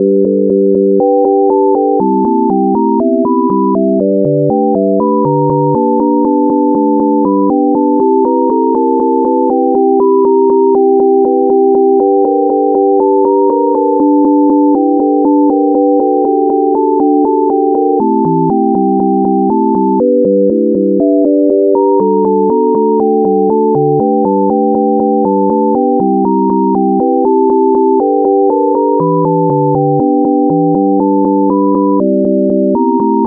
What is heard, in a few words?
sine major blues; Major Blues Scale; music generation library in Rust